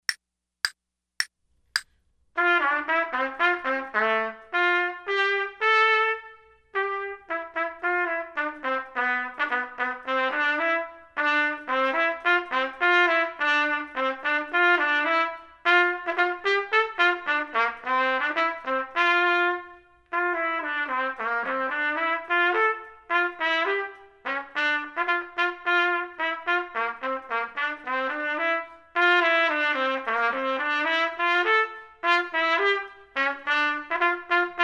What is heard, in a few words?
trumpet